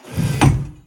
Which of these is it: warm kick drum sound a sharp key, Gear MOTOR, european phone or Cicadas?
Gear MOTOR